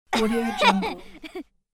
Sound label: girl chuckling; human sounds